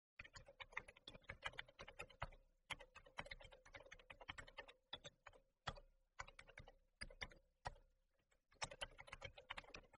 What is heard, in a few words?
keyboard gaming